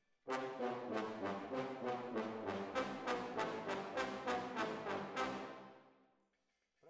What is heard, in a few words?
ORTF, Jazz for piano